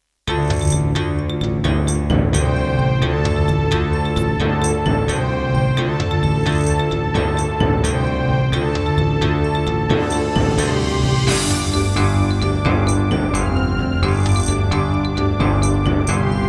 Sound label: Roland SC-88 Pro 88 MAP, wavetable; Heretic